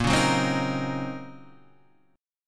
Eb Minor 13th Bb

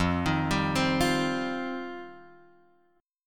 F 7th Suspended 2nd F down Guitar Drop D

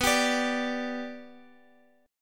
B-Minor Sharp 5th, Guitar, Open F; strummed; JGuitar

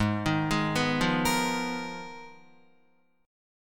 Ab Minor 6th Add 9th, Ab, Guitar; arpeggiated; JGuitar

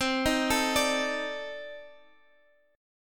C Minor 6th Add 9th, C, down, Guitar, Open F; arpeggiated; JGuitar

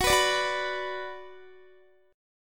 Gb Suspended 4th Sharp 5th Gb, down, Guitar; strummed

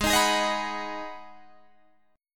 G sharp Minor 7th G sharp, Guitar Open D

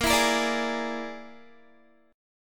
Bb 7th Flat 5th Bb, Guitar; Bb7b5 strummed; 18,19,20,19,20,x

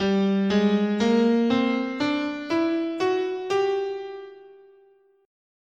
G Neapolitan Major, scale

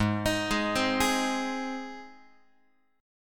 Ab Major, Guitar; arpeggiated; x,4,6,6,4,7; JGuitar

